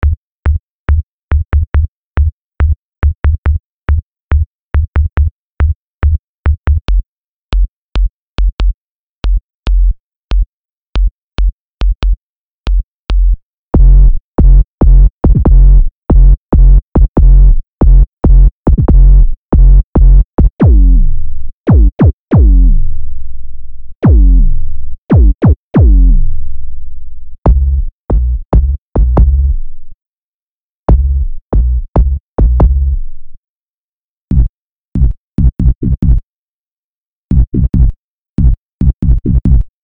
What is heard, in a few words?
analog kicks